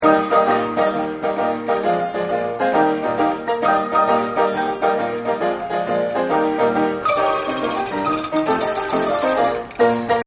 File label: Player Piano; Pianos; Musical